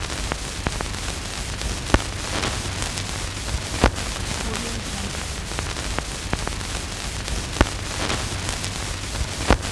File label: static noise